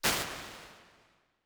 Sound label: Large Ambience, Lexicon 480L Reverb, impulse responses; Big Gee's